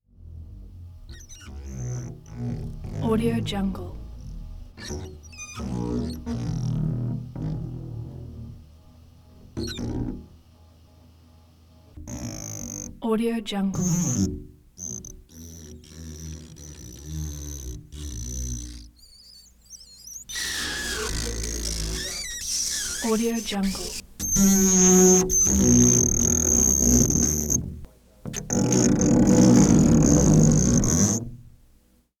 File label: rubbing glass windows tones and squeaks; prosoundfx